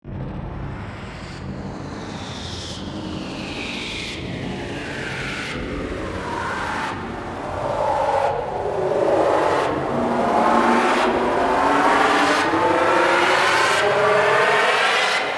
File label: Rises; neuro; Optiv & BTK